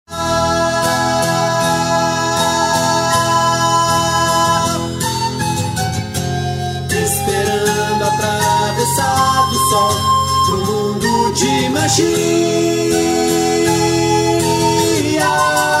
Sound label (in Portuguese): sinais Tubarao